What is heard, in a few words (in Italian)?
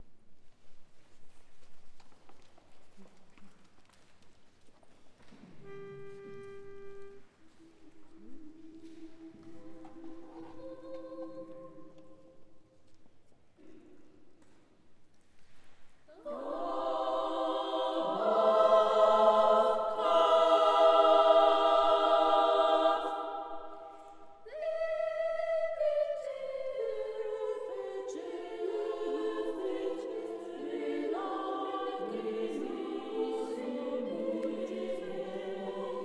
coro; J.S.Bach; Feria VI